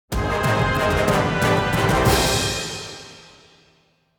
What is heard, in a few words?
battle won; Reigns Game of Thrones